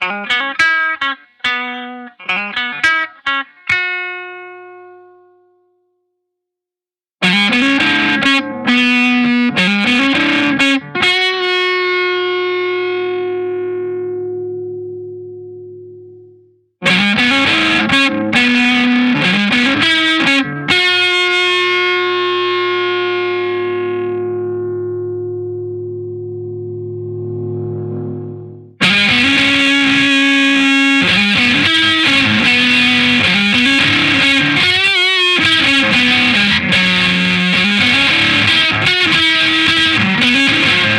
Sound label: bridge lead SOLDANO; Rhythm Bridge Sounds [No Pedal, Benderness 0%, 50%, 100%]